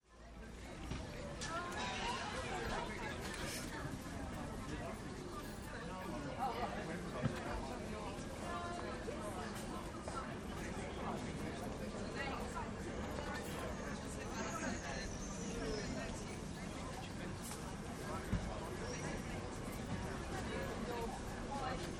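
Town Market Atmosphere - Crowd chatter - voices and activity; Crowd Sound Effects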